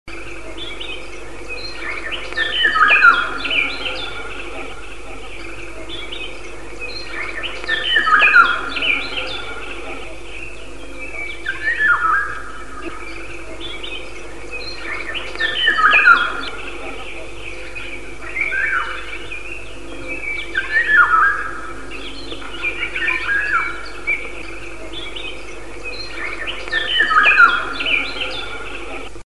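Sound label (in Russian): ivolga, golden oriole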